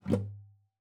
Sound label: GUITAR FX; Xsample VOL-2